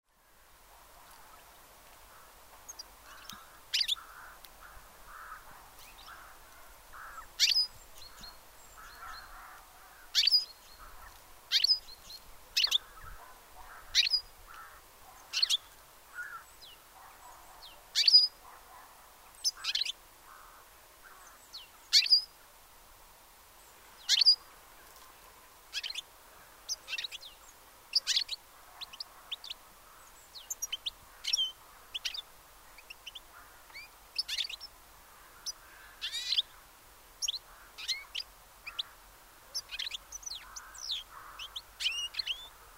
Carduelis carduelis; SETE

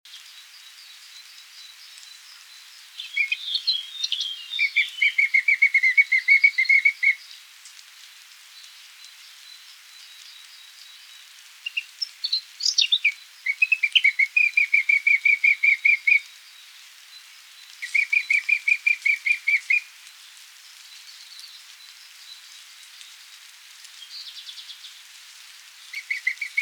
Sylvia atricapilla; SETE

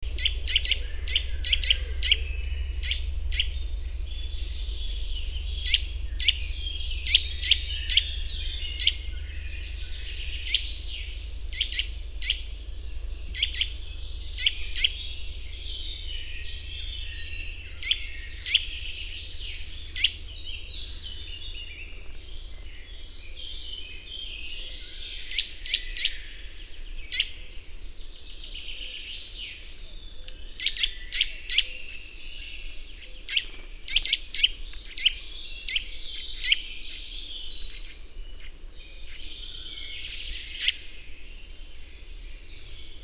Sitta europaea; SETE

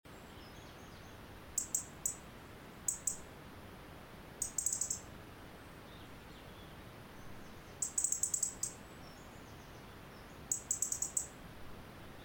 Erithacus rubecula; SETE

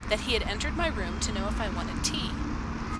noisy

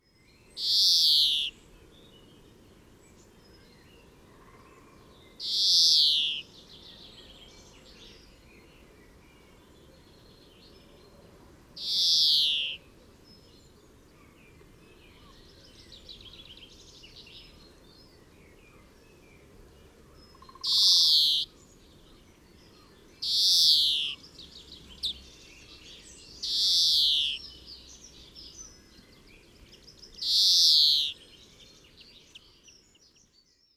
Chloris chloris; SETE

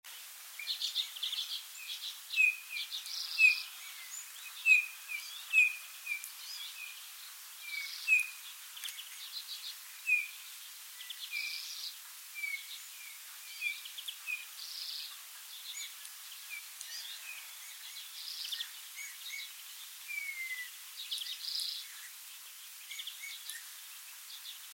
Pyrrhula pyrrhula; SETE

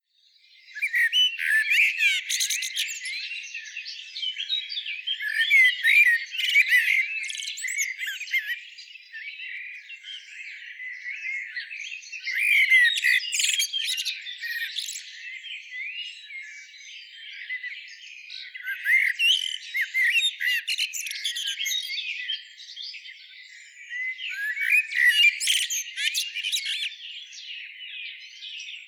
Turdus merula; SETE

